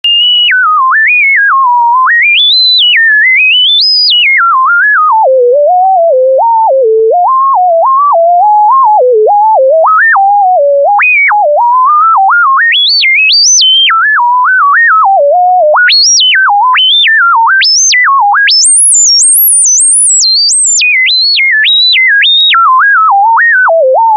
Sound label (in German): klingelton ethersynth